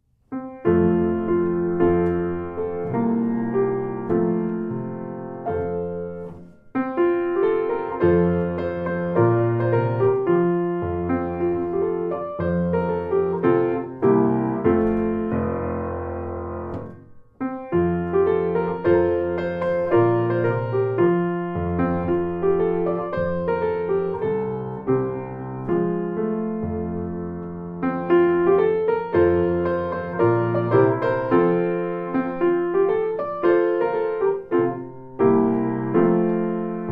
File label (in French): compiainte de mandrin piano